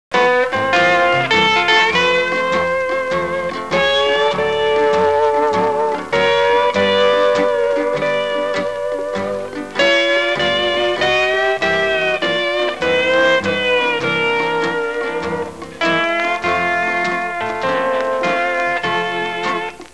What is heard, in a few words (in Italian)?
musica strumentale